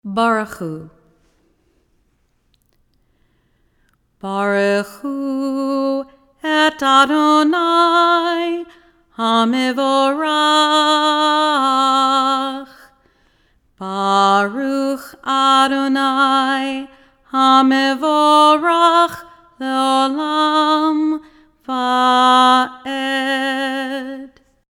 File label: barechu